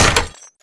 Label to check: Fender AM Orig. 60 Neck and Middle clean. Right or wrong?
Wrong